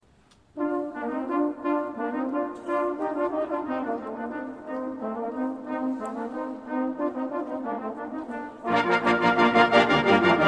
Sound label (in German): concert b dur 4 satz allegro